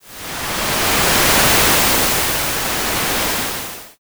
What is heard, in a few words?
wave